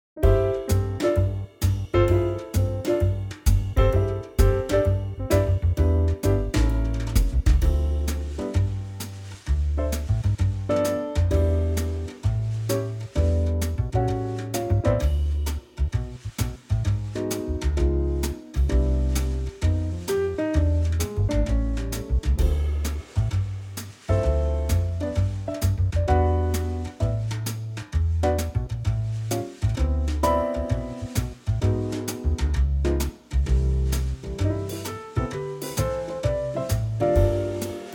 swing

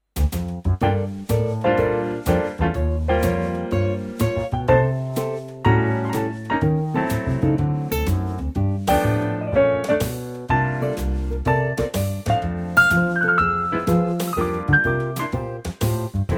F

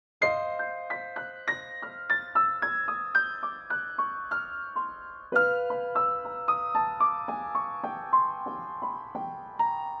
Eb piano

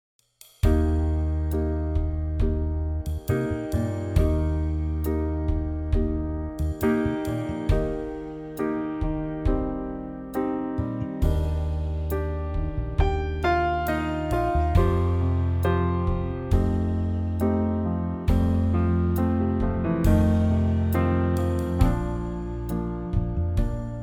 SOLITAIRE Eb

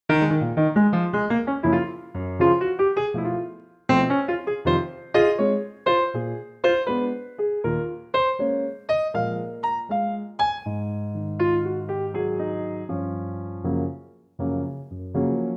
Db piano

